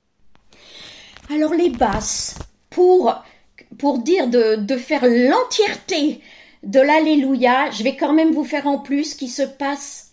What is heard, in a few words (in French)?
BASSE; BUXTEHUDE